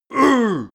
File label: male hit uh, schreie